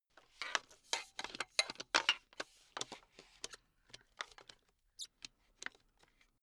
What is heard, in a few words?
metal pipe handling low